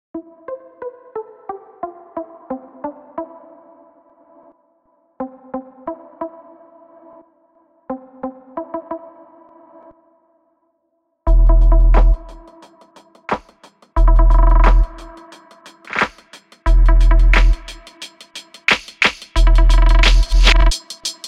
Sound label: drums